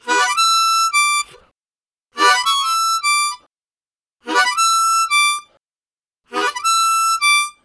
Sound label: High Flash, Harmonica Riffs; Zero G - Harmonica